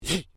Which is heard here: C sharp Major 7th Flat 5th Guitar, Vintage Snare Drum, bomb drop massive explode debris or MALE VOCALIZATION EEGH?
MALE VOCALIZATION EEGH